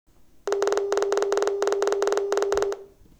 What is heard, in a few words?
signal, BPSK